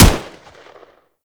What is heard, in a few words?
M16 Shot; Warframe; Grineer; M16 Rifle Wraith